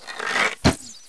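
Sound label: Bow, Attack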